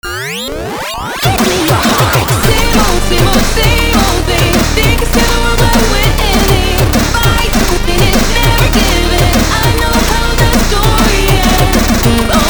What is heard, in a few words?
chiptune